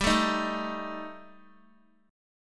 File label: G Suspended 2nd Sharp 5th, Guitar; Gsus2#5 strummed; JGuitar